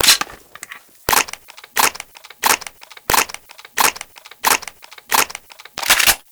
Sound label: W1200 Reload; Modern Weapons Pack